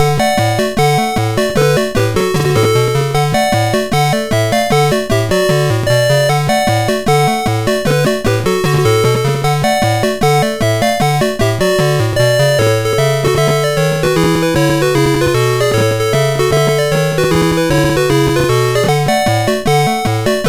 Musicbox, sid MOS6581R4

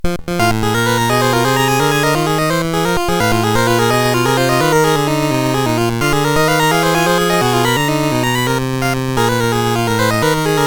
Blues, CSG8580R5

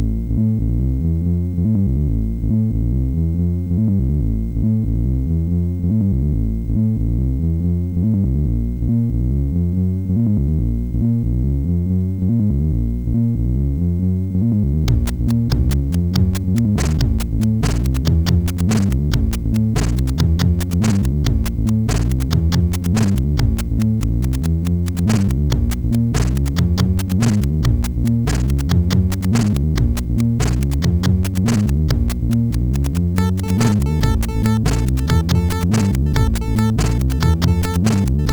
Hi Hop, MOS6581R4; hvsc

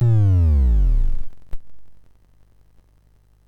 Project Firestart, MOS6581R4

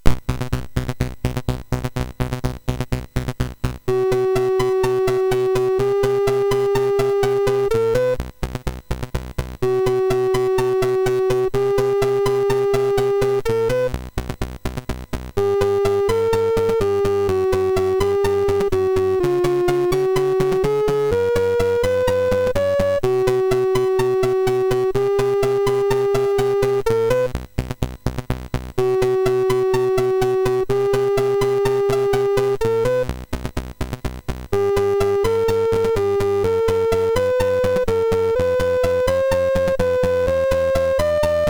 sid MOS6581R3, GAMES; Die Hard

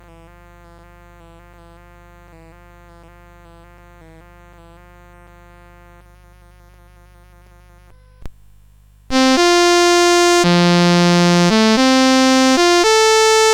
Sword Motif, CSG8580R5

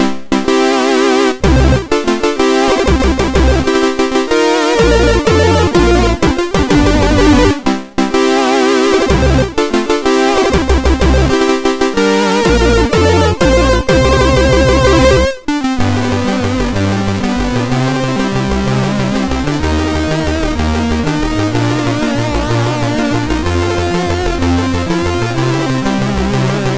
Fanfare, MOS6581R2; sid; Kinderegg Fanfare

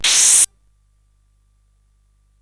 Shuffle, CSG8580R5

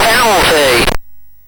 MOS6581R4, GAMES; Slapshot

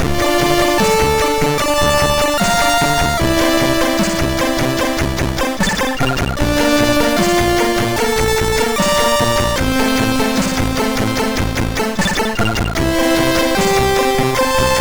Operation Omega Blast, MOS6581R3

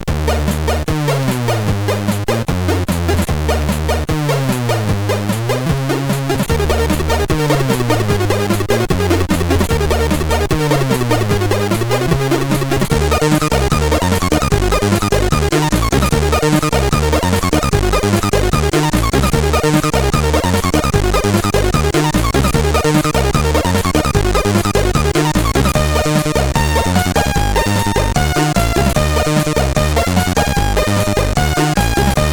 Les plus voix, CSG8580R5

